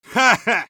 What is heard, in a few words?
Medic laugh short